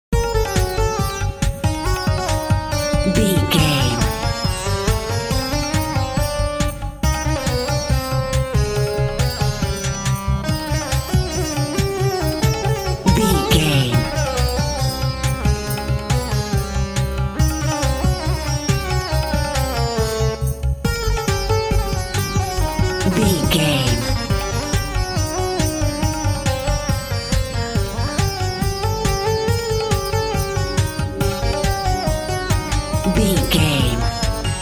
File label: egyptian video game level